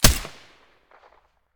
mdr silencer mono, weapons

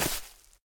bolt grass, material; STALKER; IWP

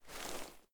gauss holster, weapons

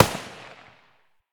akm muzzle far, weapons; ak103; STALKER; IWP

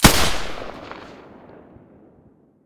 silencer g36 stereo, weapons

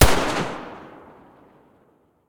muzzle spas12 mono distant

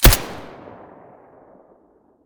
silencer colt1911 mono

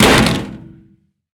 pistol drop metal thin; STALKER; IWP

